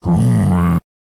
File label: Minotaur Attack Vocals